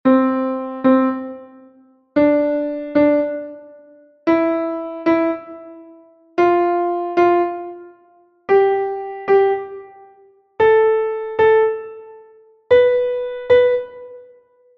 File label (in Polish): Gama C dur podskok galop